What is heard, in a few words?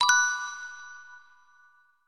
Bonus